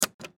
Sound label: elevator button sound